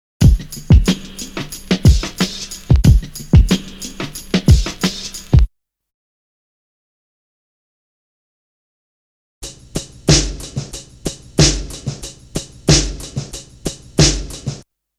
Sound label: Drum loop; BEST OF DRUM BREAKS 30